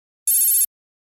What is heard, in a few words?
computer beep; NCH Library